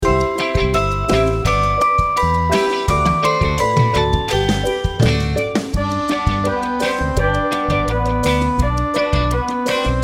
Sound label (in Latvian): Rotala in G, Flauta; Demo ar melodiju